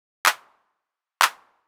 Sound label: tr-8 clap